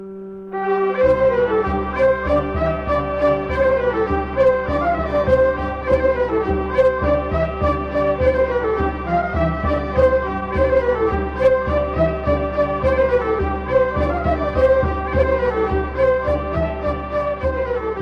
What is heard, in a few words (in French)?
Maclote, Polka, Belgique